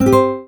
PUZZLE Success Guitar Three Note Climb Dry; stereo; Universal Sound FX

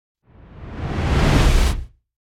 Whoosh Fat Storm - reversed; Hollywood Edge; sound designer's toolkit v2

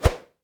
Sword Whip; Weapons; 1001 Sound Effects

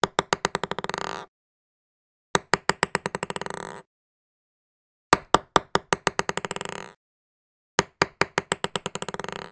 ping-pong ball; devices accessories utilities; NS Studios - ultra sound collection

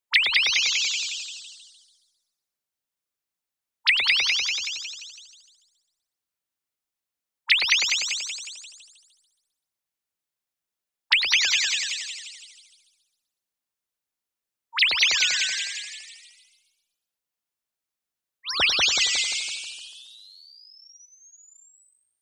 tonal, sine; boom library; Anime Essentials

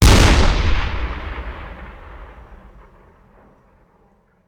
Cannon Tank; Sound Ideas; Guns

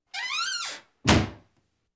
Household Phones

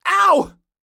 police hit bad short; donnie; Blind Drive

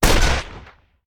Rifle; Sound Ideas; Guns

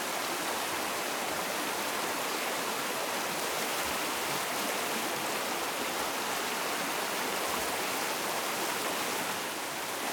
waterfall; Apotheorasis

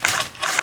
Gun Weapon Pickup; SFX Kit; Pickups

